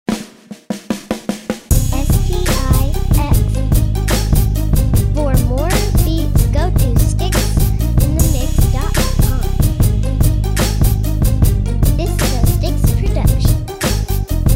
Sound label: pizzicato